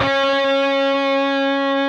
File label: PRS D, Guitars, LEAD